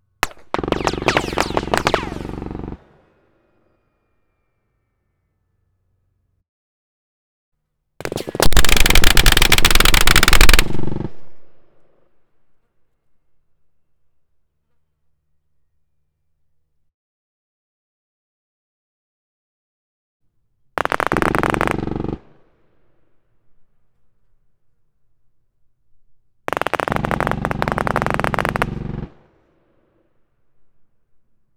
MG 42, Firing, Burst, Long, Mountain Top, machine gun; Pole Position